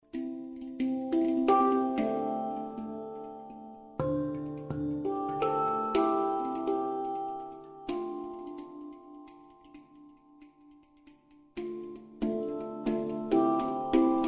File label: handpan